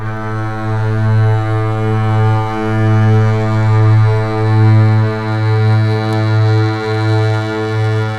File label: LEG MF R, The Ultimate String Collection; East West